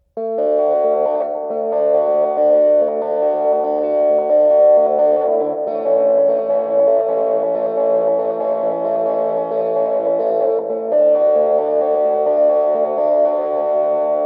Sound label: electric